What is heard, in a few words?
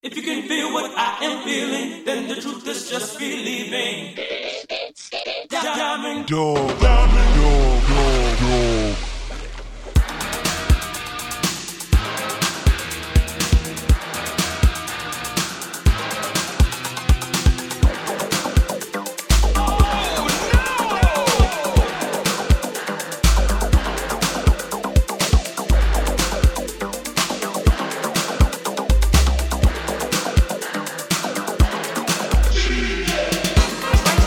ageless vocal dub; Megamixes